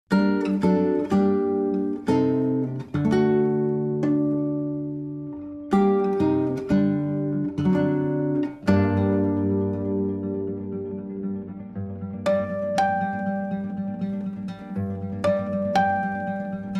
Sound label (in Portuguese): barcarola em sol menor